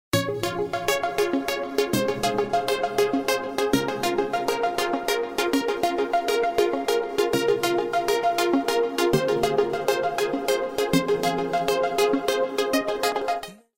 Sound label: Ringtone